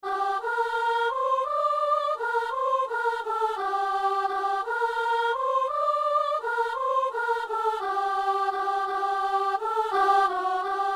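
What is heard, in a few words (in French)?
chrétiens chantons, soprano; Messe du 17 janvier à Saint-Denis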